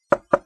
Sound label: knock